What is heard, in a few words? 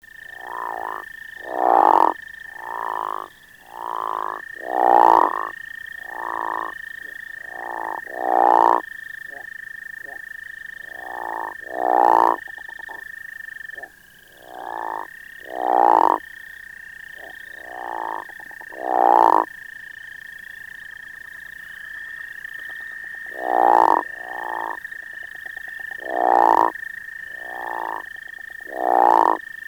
crawfish; fish wild